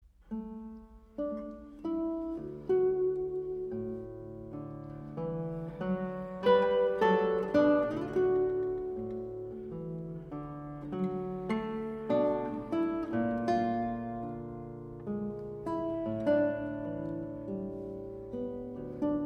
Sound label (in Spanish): guitarra